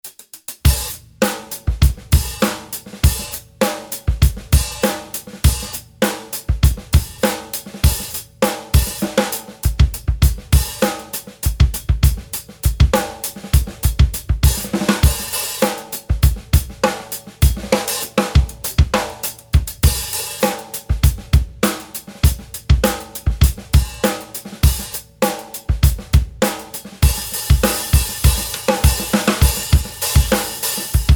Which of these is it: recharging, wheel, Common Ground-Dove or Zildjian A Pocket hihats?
Zildjian A Pocket hihats